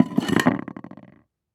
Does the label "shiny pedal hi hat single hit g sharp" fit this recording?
No